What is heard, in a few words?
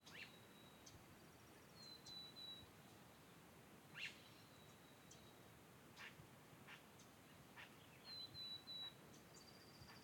Ambience, Field, Birds, Wind, Spring in the Pacific Northwest; Ambiences; Raconteur Sound